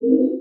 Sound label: Flash Pop Up; Multimedia Internet CD-Rom Flash